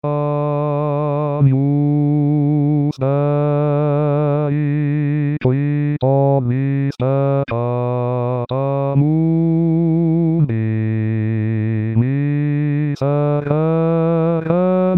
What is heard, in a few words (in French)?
Agnus, Chorale